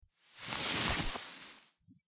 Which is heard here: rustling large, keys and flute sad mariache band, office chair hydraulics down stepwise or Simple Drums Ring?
rustling large